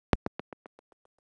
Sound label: space echo